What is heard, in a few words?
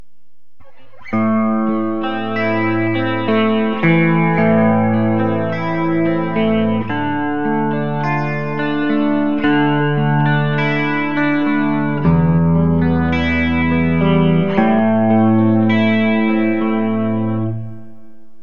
crossroadsb neck clean specpick; burny; fugly clips